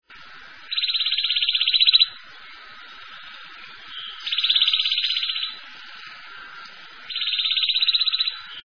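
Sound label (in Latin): junco hyemalis; junko - tryl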